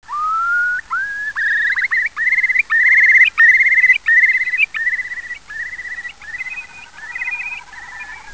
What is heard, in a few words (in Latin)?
Numenius arquata; Кроншнеп большой